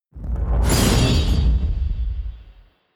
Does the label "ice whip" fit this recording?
Yes